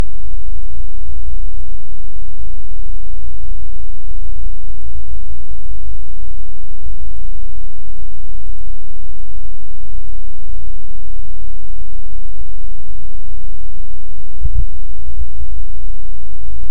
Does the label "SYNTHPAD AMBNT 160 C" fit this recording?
No